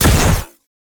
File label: Rifle Shot, Weapons; Warframe; Tenno; Gyre